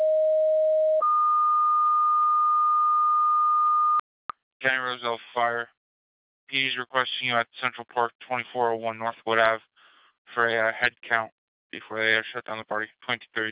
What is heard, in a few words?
two tone